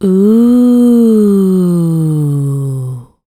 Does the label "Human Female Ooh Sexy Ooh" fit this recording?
Yes